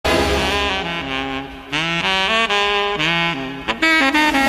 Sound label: solo